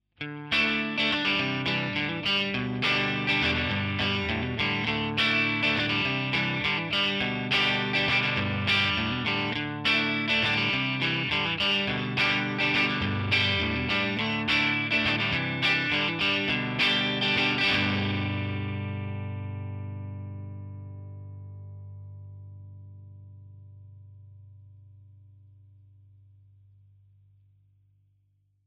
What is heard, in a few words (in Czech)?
Kytara clean Country Shure 57